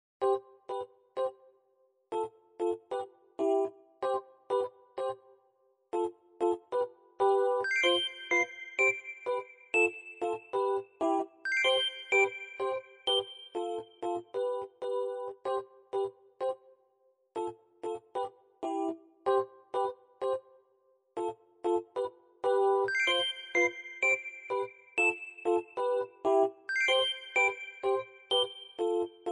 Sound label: music; Game Concept